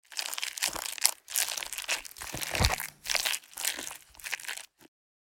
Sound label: Stirring cake mixture cake batter